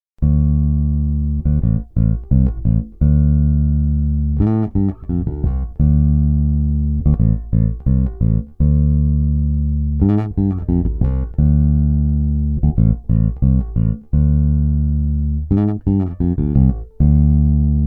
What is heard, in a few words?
Slow finger, pb humbucker